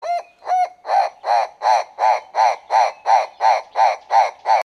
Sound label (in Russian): turako pozyv